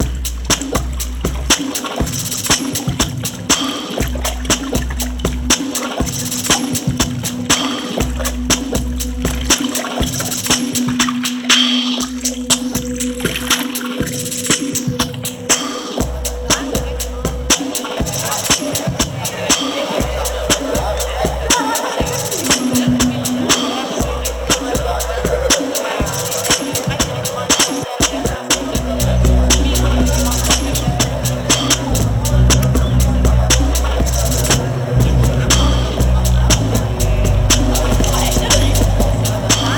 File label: ice siren dub; weeklybeats 2024